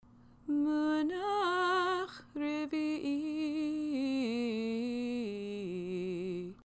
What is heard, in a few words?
munach revi i